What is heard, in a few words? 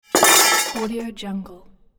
steel poles dropping